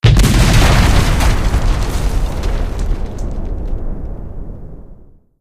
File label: bombing run; Left 4 Dead 2; Source Engine